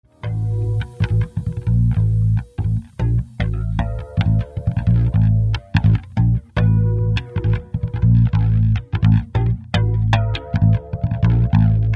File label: acoustic quartet blues